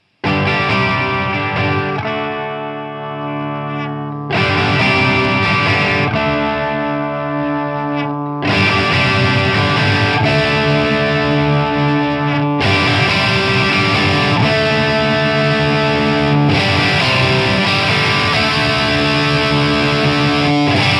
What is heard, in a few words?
Carl Martin Panama Volume Drive